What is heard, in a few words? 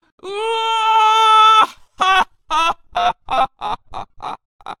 Witch Attack Vocals